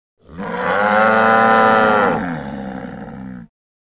Cattle, Cow Bellow. Group Bellows Together. Close Perspective.